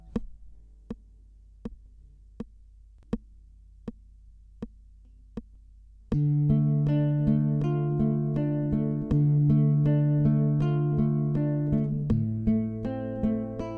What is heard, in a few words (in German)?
Akkordwechsel, gitarre